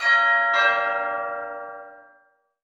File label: Chime Bell; d4dj groovy mix; android